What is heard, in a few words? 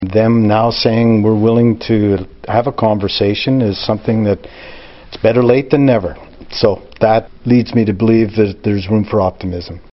Tom